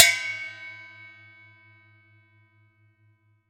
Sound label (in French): couvercle; 96khz 24b mono; In the kitchen